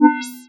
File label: powerup appears